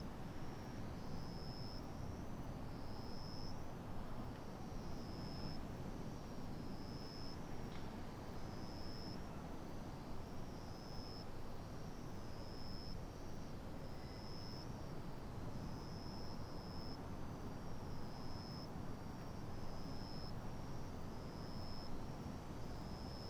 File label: outdoor town night